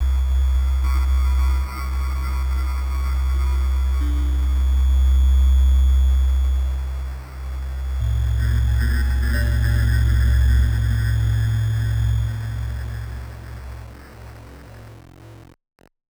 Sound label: flare sweep